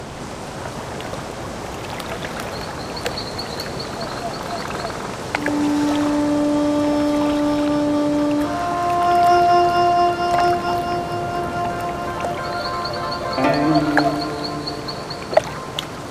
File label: Mooncake Festival Ambience; Angry Birds Trilogy; Xbox 360